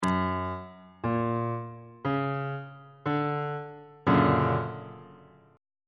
e double flat augmented major 7th 1st inversion

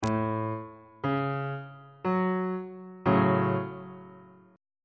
g flat minor 1st inversion

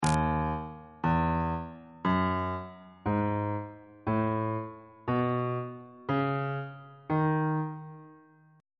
e flat locrian mode on bass clef

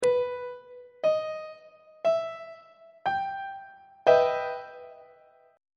d double sharp minor major 7th 2nd inversion; on treble clef

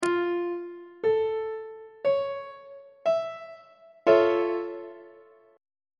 e sharp augmented major 7th chord on treble clef

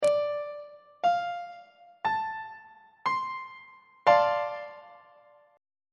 f major 6th 3rd inversion; on treble clef

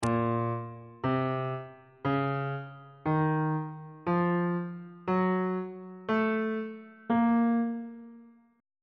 a sharp harmonic minor scale on bass clef

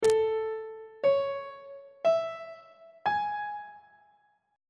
a major 7th chord 1 is a major 7th; on treble clef

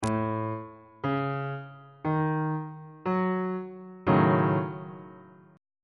f sharp minor 6th 1st inversion on bass clef

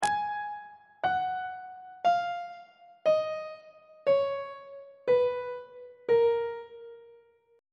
b flat phrygian mode descending on treble clef

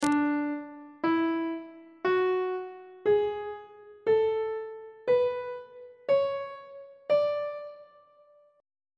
d lydian mode note positions on treble clef

